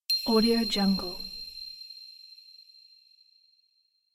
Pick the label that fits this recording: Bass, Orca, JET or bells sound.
bells sound